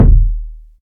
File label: long tail reverb bass drum single hit d sharp key